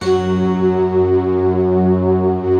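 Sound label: harpsichord, slow harpsiflute; Optical Media International; Sonic Images Library; Harp Synth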